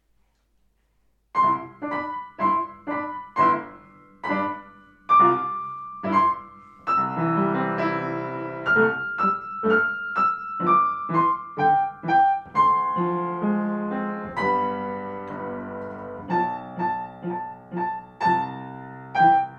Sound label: Dance of the Reed Flute